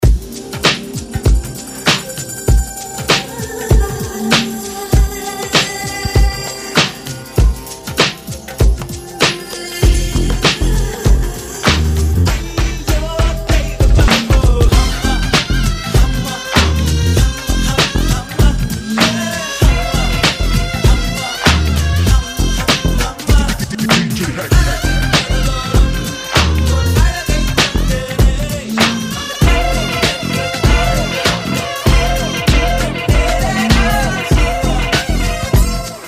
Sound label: Arabic Hypnosis